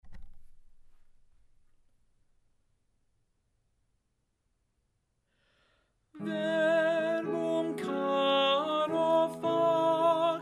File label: Verbum Caro Alto; Verbum Caro Factum Est, Anon.